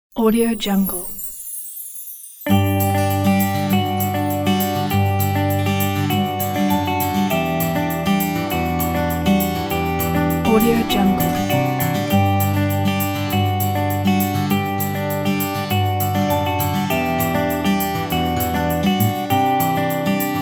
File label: Christmas Country